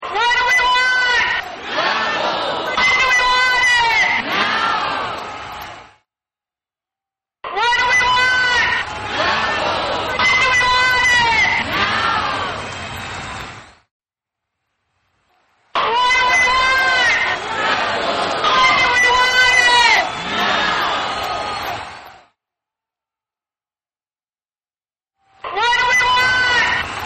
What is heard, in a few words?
ringtone